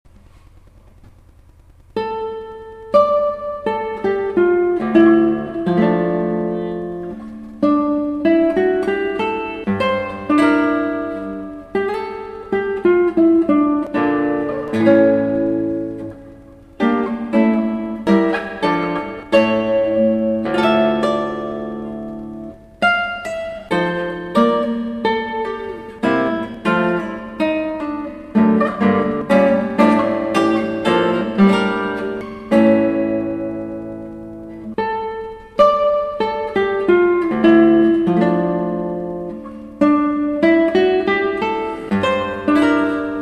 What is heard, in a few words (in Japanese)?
エンデチャ, ギター